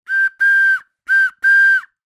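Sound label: musical whistle wooden blow single note x4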